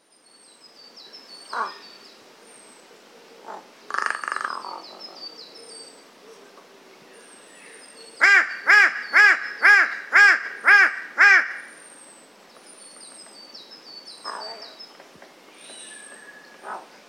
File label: Corvus corone; SETE